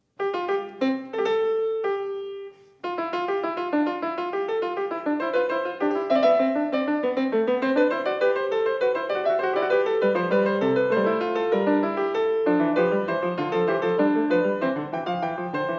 Fugue No. 1 in C major; BWV870; Bach; Glenn Gould; The Well-Tempered Clavier; WTC Vol II

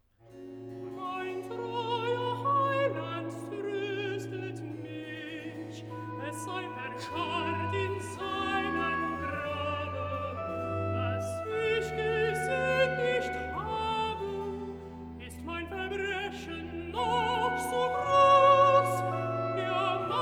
Cantata, Recitativo (Alt) e Choral; BWV 5 'Wo soll ich fliehen hin'; Bach; Potsdam; 19th Sun After Trinity

